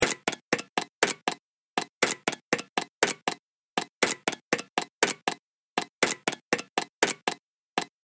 Industrial Revolution Machine Loop, machinery sound effects